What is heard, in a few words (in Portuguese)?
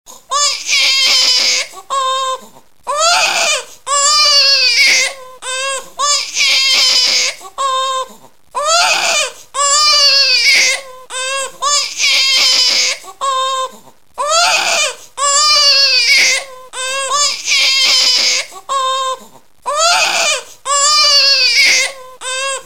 choro de bebê